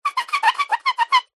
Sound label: sport snooker cue chalk up squeak; zapsplat